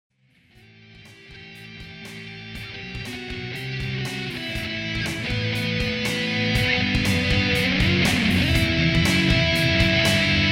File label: Metal Side